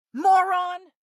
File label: car reaction; Blind Drive